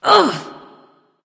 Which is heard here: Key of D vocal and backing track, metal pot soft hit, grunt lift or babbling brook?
grunt lift